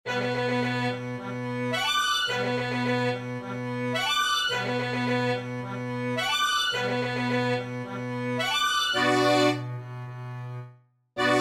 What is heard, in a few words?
Tango